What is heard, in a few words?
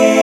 SYN SEQ, dance synth; Sound & Vision - Gigapack I CD 2 (Roland)